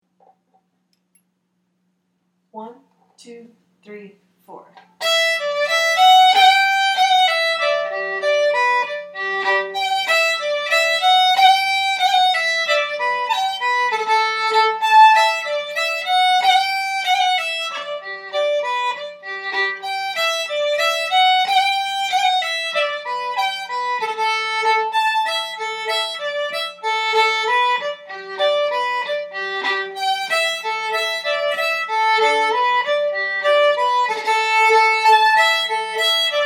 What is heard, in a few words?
Fiddle Medium; Muilean Dubh